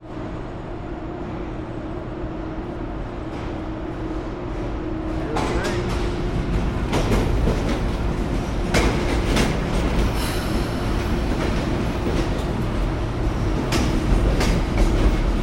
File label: MTA Metro North Electric Train Leaving Grand Central Terminal